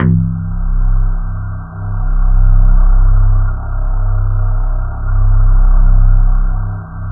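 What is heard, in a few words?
BAMBOO VOC, Kurzweil K2000; BASIC-KW2000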